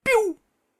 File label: laser guns; SyrinScape; Syrinscape unplugged; Jungleplanet dinolaser battle